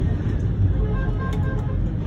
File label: honk